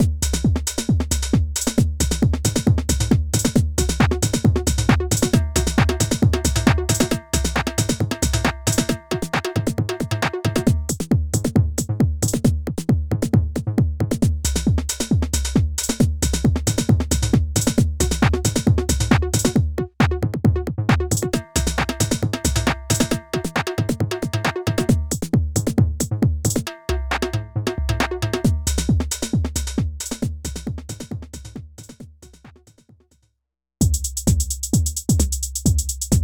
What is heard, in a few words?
groove